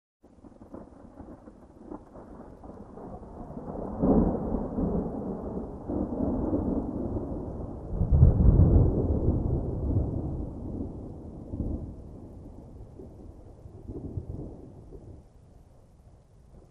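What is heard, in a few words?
Thunder; Receding Thunder - with Light Rain On Pine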